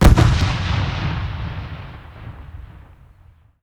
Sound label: Explo; DirectXTK